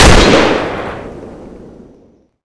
m16 single, weapons; svencoop

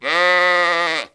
baa, sheep; monster; wizardwars

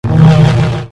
sabre spin

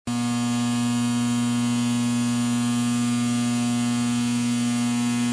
electric

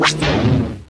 gun; svencoop

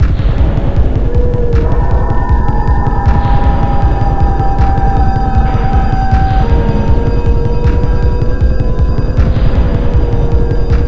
music; halloween cemetery; aom

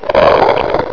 friendly pain; portal house

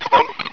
idle, zombie

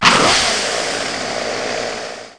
chainsaw start

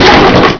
wood, debris

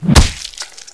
claw strike; svencoop

